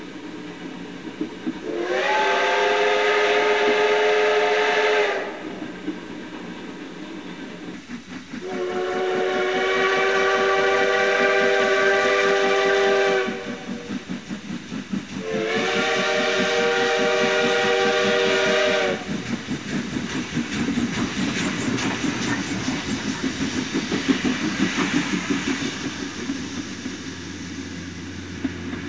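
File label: background music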